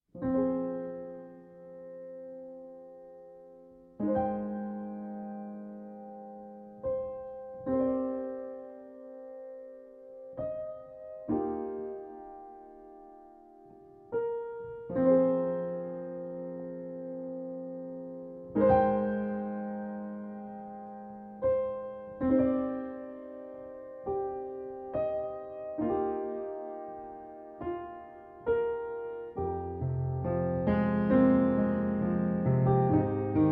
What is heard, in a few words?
Piano Reworks